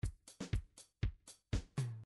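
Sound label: disco funk, midi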